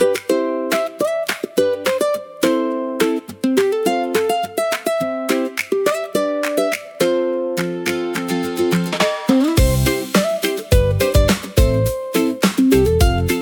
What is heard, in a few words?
Acoustic pop feel good; identite sonore ia